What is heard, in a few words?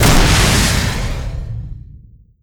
fbomb shoot; tf2classic